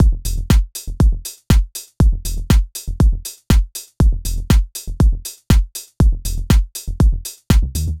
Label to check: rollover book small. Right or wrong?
Wrong